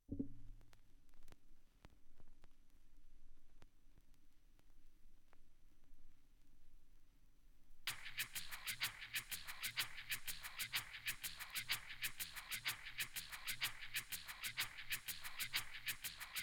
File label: Vocale Original Mix